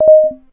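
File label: synth, Drum & Bass, 130 BPM; Ueberschall; Drum & Bass Resonance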